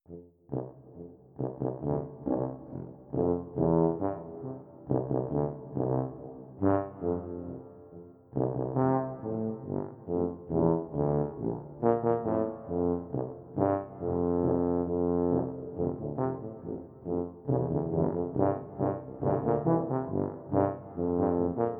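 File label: happy birthday tuba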